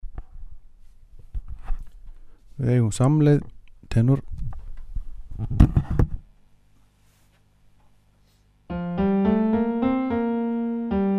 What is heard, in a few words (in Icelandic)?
tenór